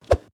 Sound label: sent message success; Itel; S25 Ultra; ui